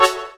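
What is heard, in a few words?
synth big reverb; Best Service Gigapack 1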